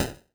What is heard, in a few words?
mage hit; Incantation